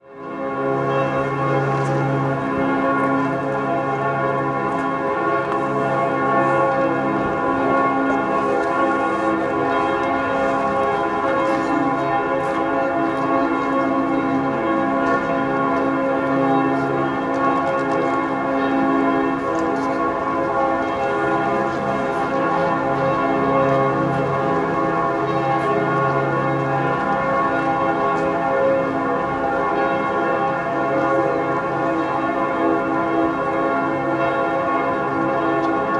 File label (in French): Cloche